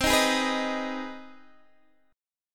C Minor Major 7th Sharp 5th, Guitar, Open D; down; chord shape

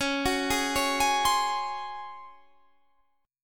C sharp Major 7th Suspended 4th, Guitar, Standard; arpeggiated; chord; 21,21,18,18,21,20; JGuitar

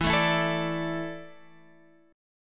E 7th Suspended 4th, Guitar, Open F; E7sus4; strummed; x,11,9,11,12,9

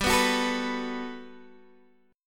G Major 9th, G, down, Guitar, Open G

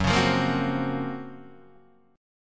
F sharp Major Sharp 11th, Guitar DADGAD

